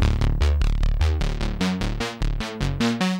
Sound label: plasticity synth